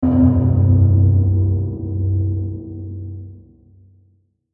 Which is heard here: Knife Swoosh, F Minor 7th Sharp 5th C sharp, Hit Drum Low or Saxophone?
Hit Drum Low